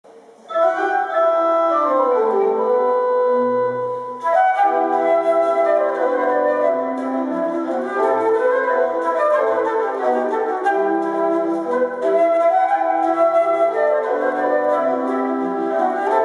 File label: Hornpipe